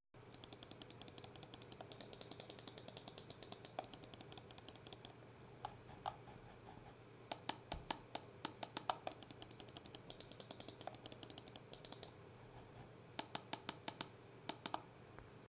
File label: Corsair IronClaw RGB